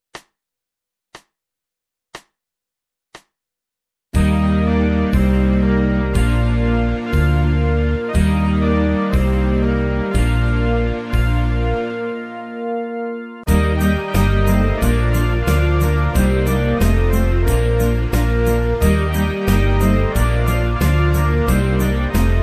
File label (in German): Lustige Gänse Russisches Kinderlied; Schulband Musizierklassen; percussion